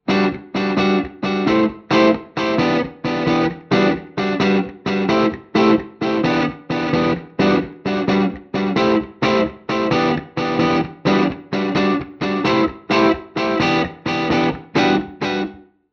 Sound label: delay